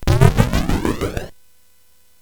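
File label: Nebulus, MOS 8580 SID chip music, games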